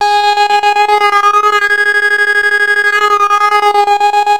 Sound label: SYNTHS WABBA G4; OBMX-SYNTHS